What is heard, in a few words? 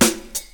reverb hip hop snare a sharp key